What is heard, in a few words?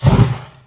fire arrow